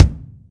drum hit normal; osu!

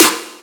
soft hitclap; osu!

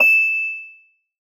soft hit whistle; osu!

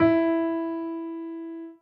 soft hit whistle; osu!

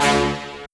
soft hitwhistle; osu!

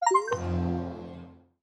deck ui achievement toast; steam; steamui

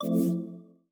deck ui show modal; steam; steamui

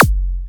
soft hitclap; osu!

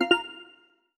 confirmation positive; steam; steamui

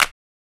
soft hitclap; osu!

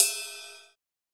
normal hit whistle; osu!

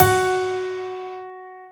soft hit whistle; osu!

